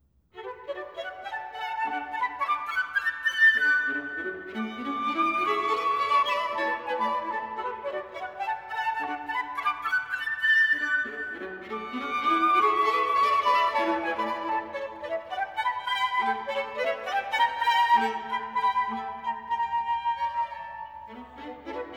Serenade in D major for flute, violin & viola Allegro scherzando e vivace; Brilliant Classics; Serenade Op.25, Works for Mandolin & Piano